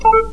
video beep

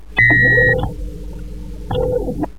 key door

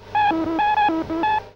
multi beeps

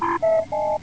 comp sound

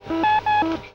multi close

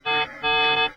comm beep

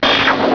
stun gun

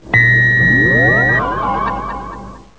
laser barrier

